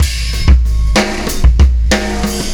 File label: Landing Party Beat, Processed Drumkits